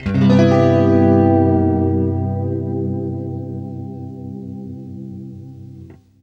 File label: FLESH CHORDS, Guitar